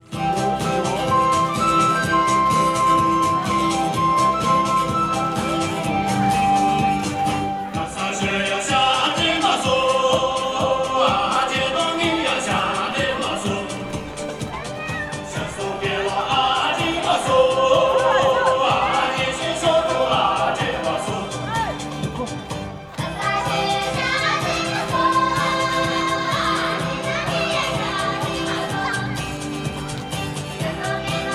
shangri La Dance, china; Les Cartes Postales Sonores; NOISE ON EARTH Vol6

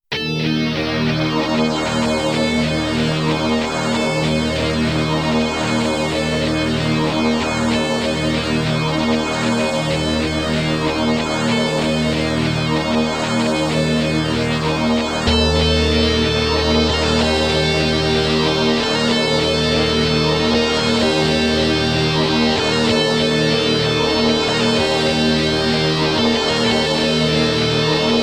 Dark Epic Triumphant Metal; CUES for film TV games etc

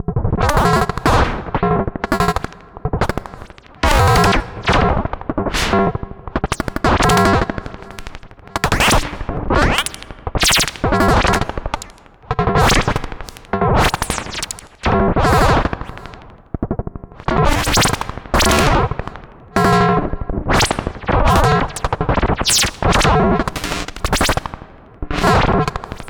kick explorations

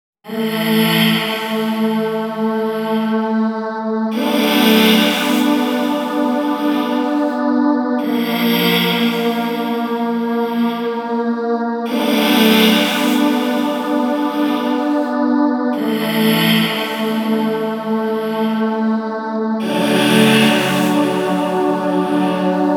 Chill Calm Gentle Breathy Dreamy; CUES for film TV games etc

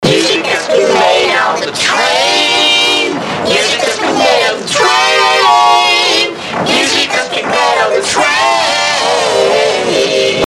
Music Album Promo Jingle

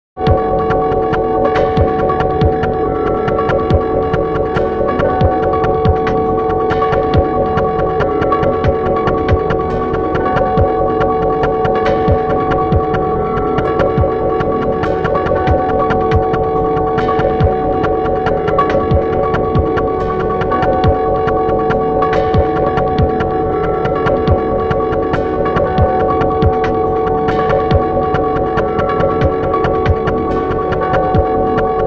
noise

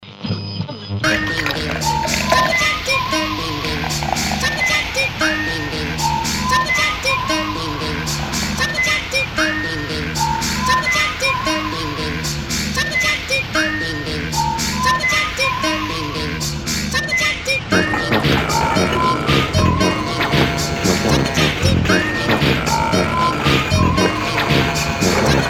The Living Dead Whistling Quartet

